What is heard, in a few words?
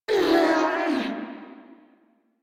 Death Grineer Female, Barks, Battle; Warframe